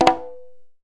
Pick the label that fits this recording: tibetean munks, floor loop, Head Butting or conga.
conga